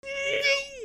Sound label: scream, zombie screams